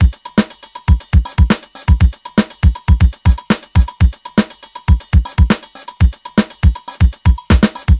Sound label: 120 beat full, Nu Jazz House; PowerFX